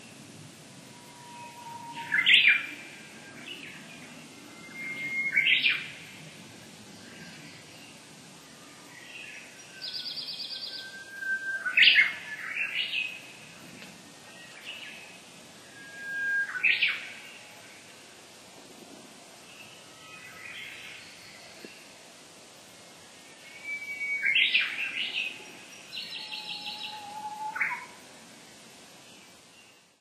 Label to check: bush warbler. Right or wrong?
Right